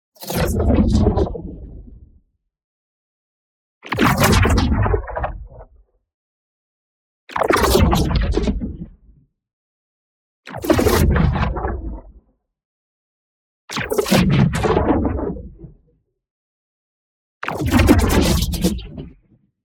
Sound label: ABILITY CHARGE Superpower Invocation; boom library; Matter